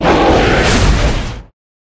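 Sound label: Rat buff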